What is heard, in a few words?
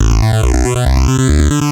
TECHNO BASS 140 A